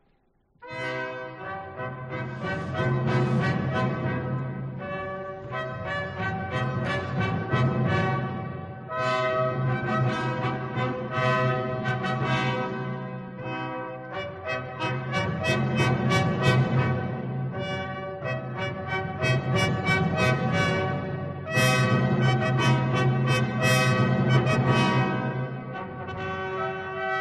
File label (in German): Aufzuege fuer Trompeten und Pauken, Allegro assai; Antonio Salieri